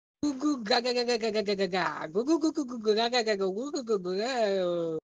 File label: Goo Goo Gagagagaga